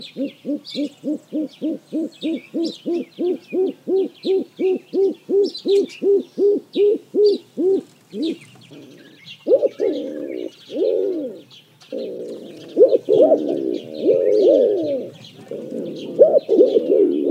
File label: Speckled Pigeon